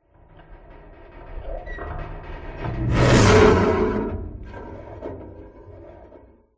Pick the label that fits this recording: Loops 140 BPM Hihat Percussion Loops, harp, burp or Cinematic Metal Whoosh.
Cinematic Metal Whoosh